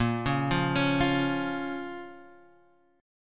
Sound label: Bb Minor Bb down Guitar Standard; arpeggiated; JGuitar